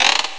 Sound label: wakaiko set; Amiga Soundtracker Sample Packs